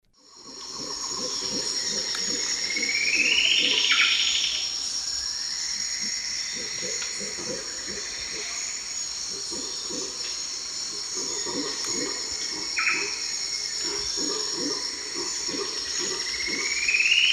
Xiphorhynchus obsoletus, song; S. America; Eastern Lowlands

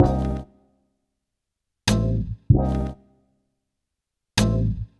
Guitar, RnB

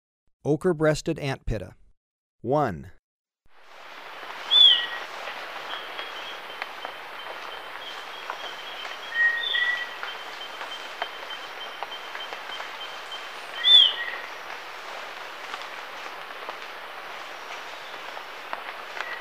Ochre-breasted Antpitta, Birds of Ecuador; S. America

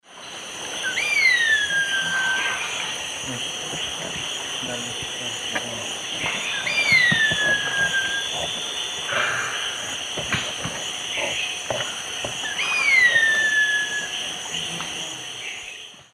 Attila cinnamomeus, call; S. America; Eastern Lowlands

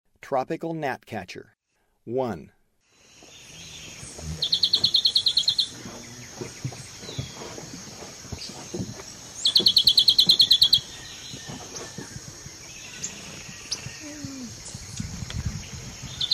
Tropical Gnatcatcher; S. America; Eastern Lowlands

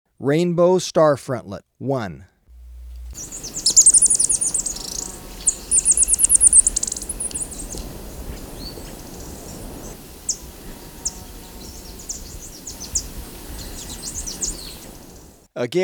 Rainbow Starfrontlet; S. America

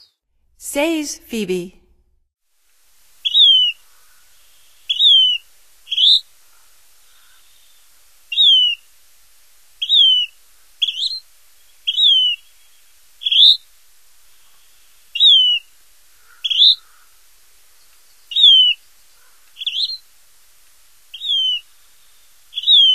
Says Phoebe; North America; Stokes

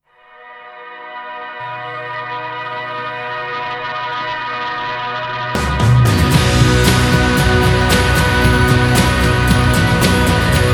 Instrumental

